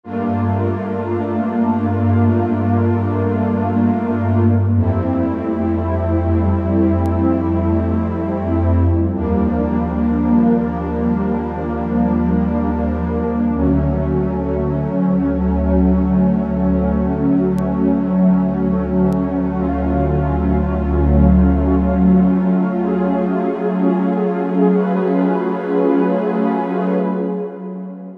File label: Pad ulush